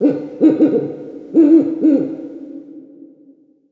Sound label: owl; ambient soundscapes; diretide owl; dota